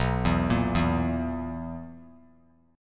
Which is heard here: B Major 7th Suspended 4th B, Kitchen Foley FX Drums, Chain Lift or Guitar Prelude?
B Major 7th Suspended 4th B